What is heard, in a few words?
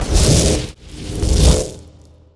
wormhole